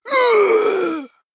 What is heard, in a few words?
Male Broken Death